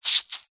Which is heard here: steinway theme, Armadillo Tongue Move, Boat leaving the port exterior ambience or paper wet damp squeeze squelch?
paper wet damp squeeze squelch